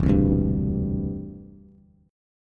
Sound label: E Minor 7th Sharp 5th E, Bass; strummed